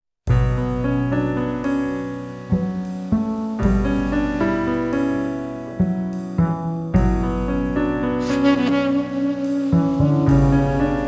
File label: 72bpm Tenor Sax